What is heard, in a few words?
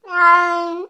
Cat Domestic Meow Sad